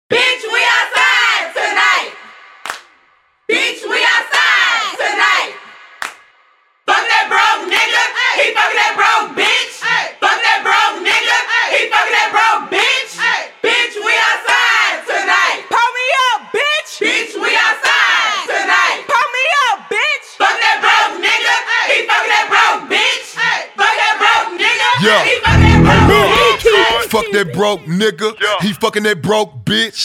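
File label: outside, instrumental, 71 bpm, D flat minor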